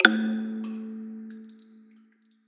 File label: tonal oneshot A; Pop; Slate; PASADENA Pop Sample Pack; Tonal One Shots